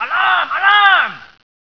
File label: alarm